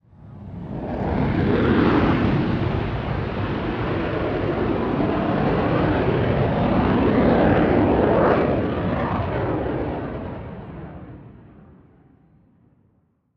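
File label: Plane Dh110 Sea Vixen overhead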